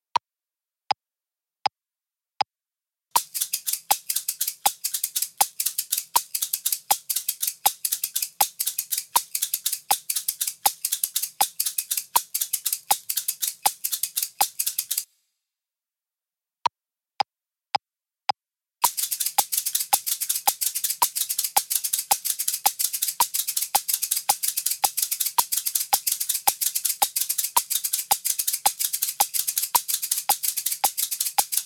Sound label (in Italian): Con due shakers